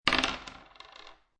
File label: Dice Throw Two Dice On Wood Table